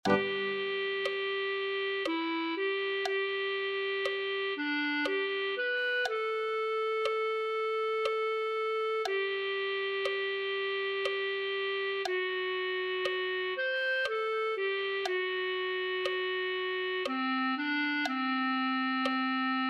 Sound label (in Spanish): clarinete1